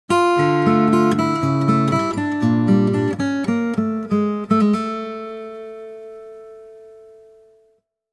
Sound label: Death, solo guitar